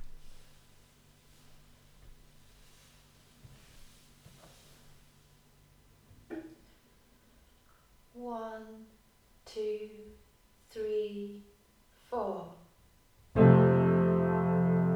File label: the church organ